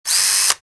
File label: Electric screwdriver short rev